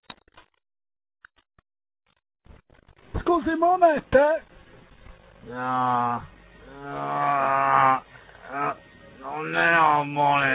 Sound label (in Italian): monete